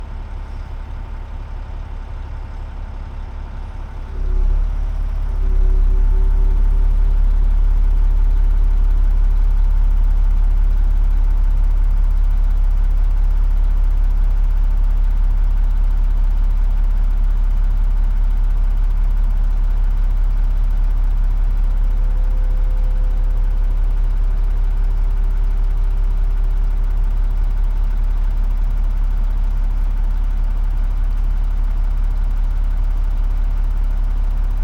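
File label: Volvo dump truck exterior flatbed tilt up and down; Volvo A30E; Schoeps; Pole Position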